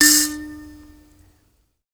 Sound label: bush mbira D 4; Heart of Africa